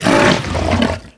pull grunt, barnacle creatures; Half Life Alyx

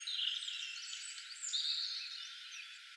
birds 1shot; animal

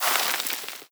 debris sand, bullet impact debris

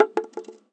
cardboard cup impact hard; physics

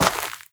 scuff, footsteps player grass

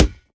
rubber, bullet impacts; physics; Half Life Model Pack

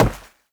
step run, footsteps, player, carpet

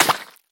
spew, infestation, bulb

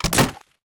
c4 plant quiet; weapons; Counter Strike Model Pack; CS:GO

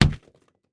fist hit, flesh; physics

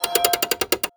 turret lost player; Counter Strike Model Pack; CS:GO; survival

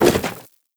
debris cardboard, bullet impact debris

